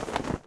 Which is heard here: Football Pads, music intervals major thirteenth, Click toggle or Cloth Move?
Cloth Move